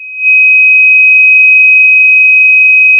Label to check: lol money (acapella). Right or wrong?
Wrong